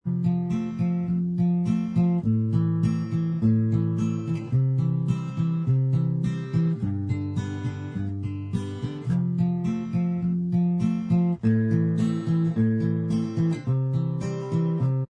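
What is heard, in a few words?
acoustic style instrumental track, backing tracks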